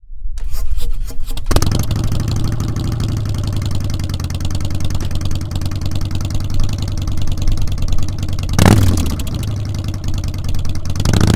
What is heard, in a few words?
2014 Dyna 103 Kick Backs Take Off